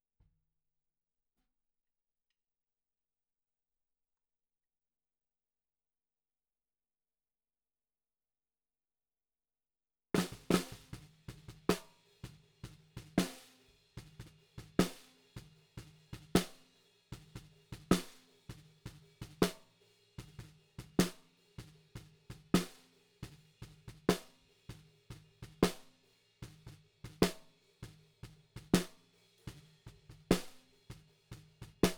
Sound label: SNR B